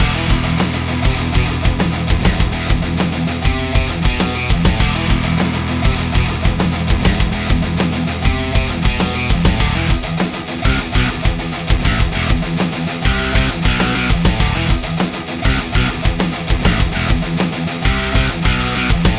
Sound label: BGM ramen